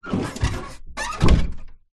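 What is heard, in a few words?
Fold Closet Door Close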